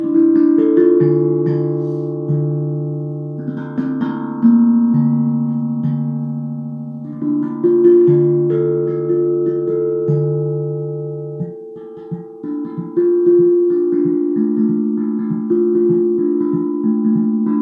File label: tank drum; oberon